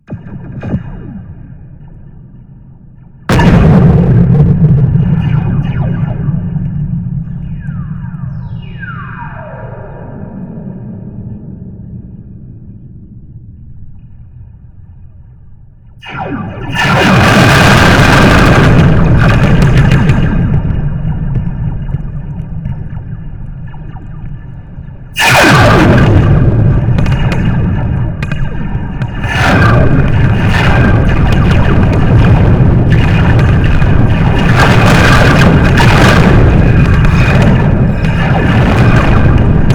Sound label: slinky bang very loud